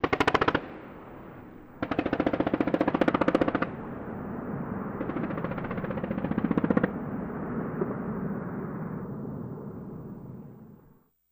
Machine Gun Firing R, modern

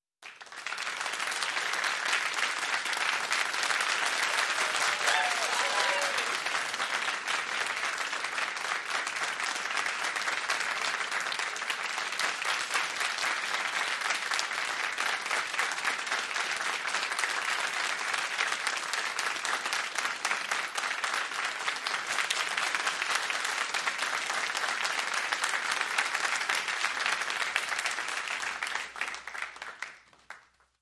Football Crowd Appl, sports arena football

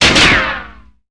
Metal Ricochets Bull

